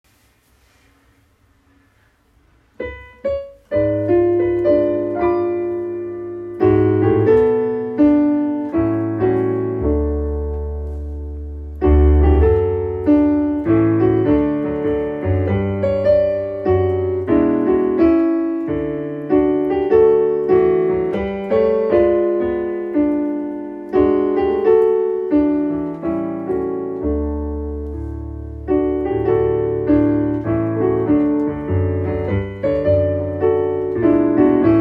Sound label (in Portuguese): playback hino